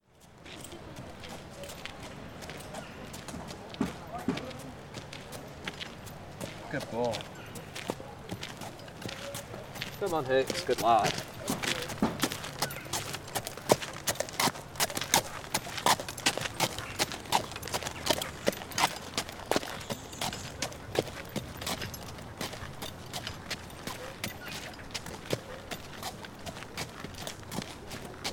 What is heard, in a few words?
Horses on the towpath at Llangollen Wharf